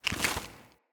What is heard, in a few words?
Acorn Plant, Objects